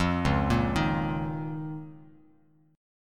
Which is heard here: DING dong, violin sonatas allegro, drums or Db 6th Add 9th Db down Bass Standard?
Db 6th Add 9th Db down Bass Standard